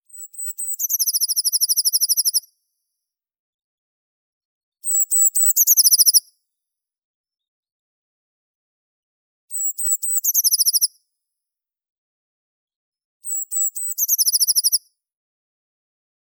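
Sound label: blue tit, Cyanistes caeruleus; lazorevka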